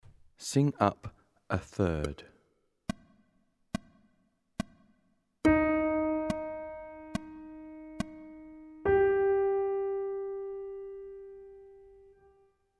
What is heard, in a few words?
Third, Intervals Aural Training